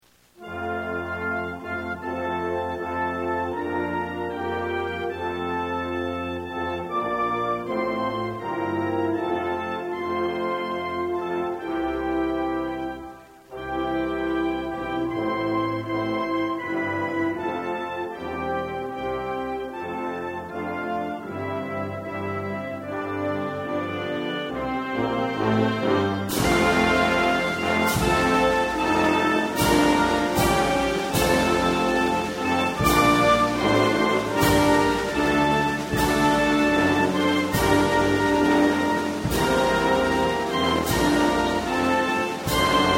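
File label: anthem, Tonga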